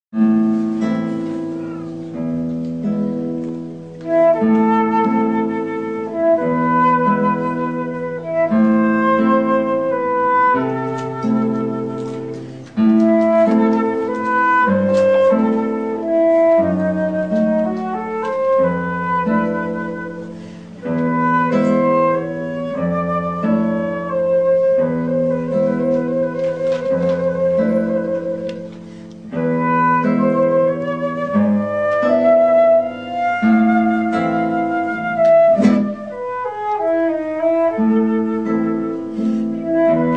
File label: Flute Guitar; Duo Sonoroso